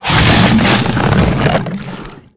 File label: worm striking arch